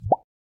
Email Sent

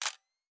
camera click; Motorola Moto G

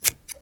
Cutting Paper, notifications; Oppo Find X5

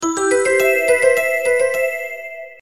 sms; Huawei C5800

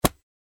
roulette

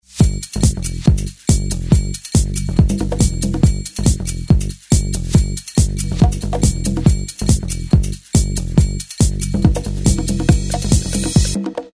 Drum Roll; Motorola ROKR EM35

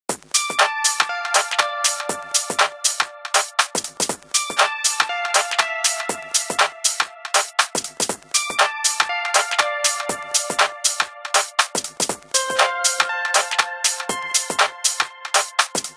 Ring Stepper; HTC AT&T Tilt 2

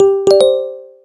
prize notification default; BLU G9

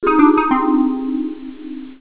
Vibe, Cisco Unified IP Phone 7945G

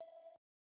Call Start; LG SH400 Alibi

